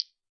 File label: ultimate jaw harp